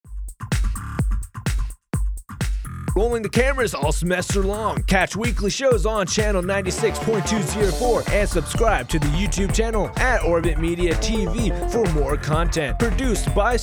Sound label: TV Promo; Orbit